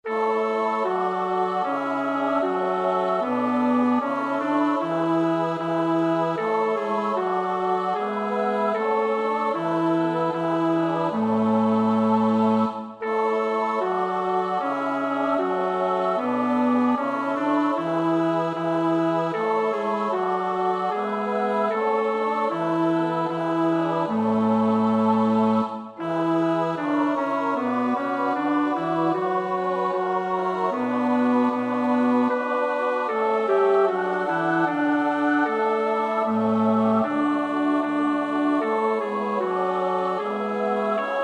bas, koorpartij oefening; Bach (Johann Sebastiaan); BWV 154; Jesu, mein Hort und Erretter

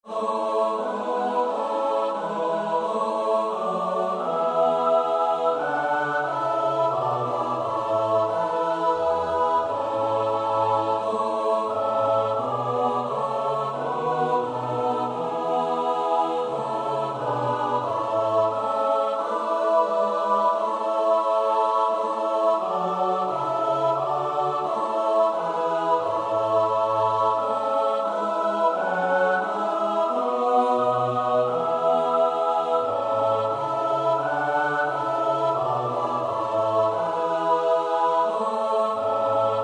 S-A-T-B, koorpartij oefening; Bach (Johann Sebastiaan); BWV 246 Lucas Passion; Ei, was hat er denn getan

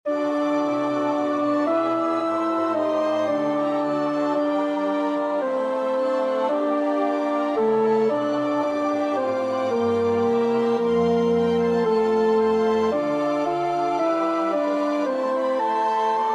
tenor; Reger (Max); O wie selig seid ihr doch, ihr Frommen

